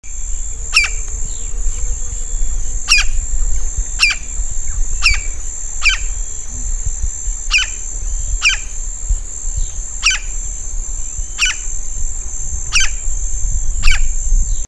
Carpintero Campestre, Campo Flicker, Colaptes campestris; cantos; Picidae